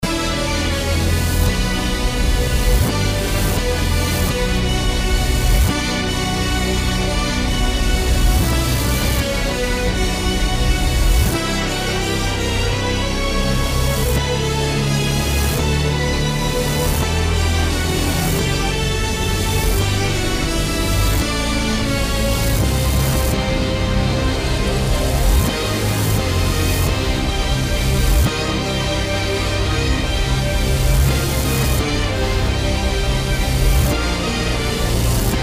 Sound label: swan lake melody reversed; Tchaikovsky; dramatic rendition